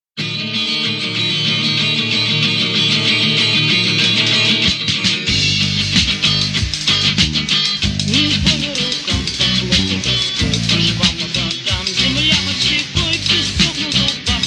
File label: Reggae